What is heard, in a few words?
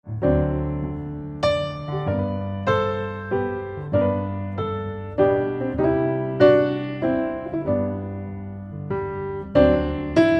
Away In a Manger Upbeat; Trad (PD)